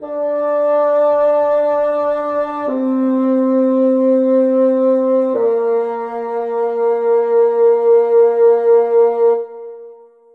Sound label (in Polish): tenor trisagion